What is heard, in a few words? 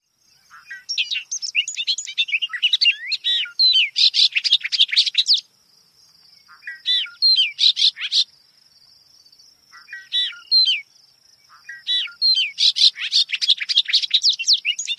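song, Bobolink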